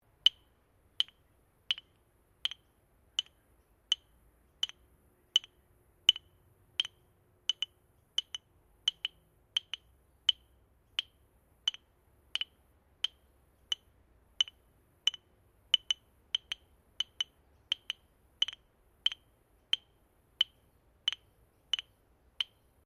Clap Sticks (Bilma) Small Sequence, Traditional Australian Aborigine rhythm sticks; Miscellaneous Musical Sound Effects